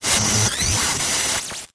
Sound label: production element glitch static, Distortion Glitch SFX; PremiumBeat